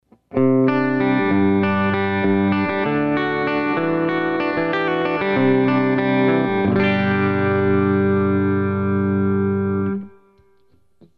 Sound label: clean